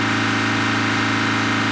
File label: engine